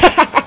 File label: sh laff, People; Sound Library 2000; Wayzata Technology